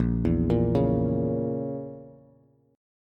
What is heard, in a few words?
Bb Diminished Bb down Bass; arpeggiated; chord shape